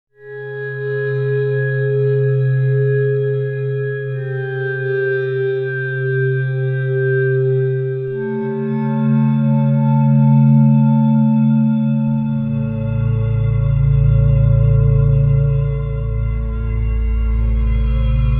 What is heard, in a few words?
Sequential Prophet X Glass Pad